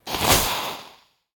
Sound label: Serpent Spell Sound